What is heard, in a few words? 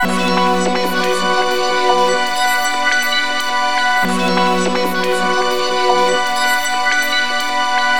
Electric Symphony; Sonic Foundry (Sony Creative Software); Club Joints and Street Anthems